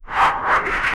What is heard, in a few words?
hide in shadow rogue ability